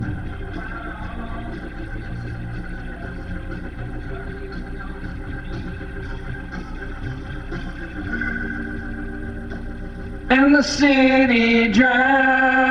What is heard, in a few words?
VOX Leslie